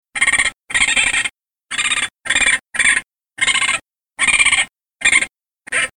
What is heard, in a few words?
Raccoon Squall Young; ORION